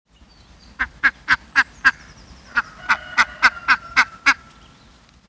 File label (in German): ente